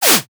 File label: whip whoosh